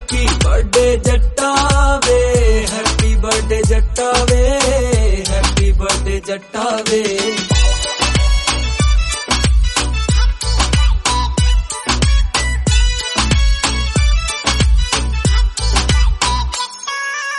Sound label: Instrumental BGM